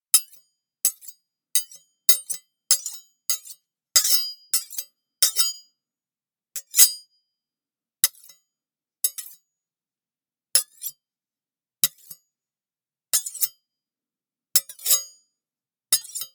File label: Carving Knives